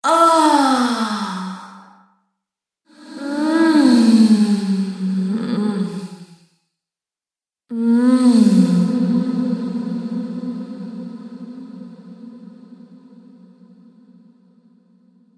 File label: Femme Fatale Moaning With Reverb Collection; Sex Sound Effects